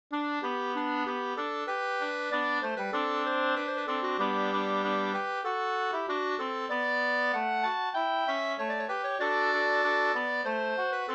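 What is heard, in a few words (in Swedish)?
brudmarsch Hogbo brudlat 2 stämmor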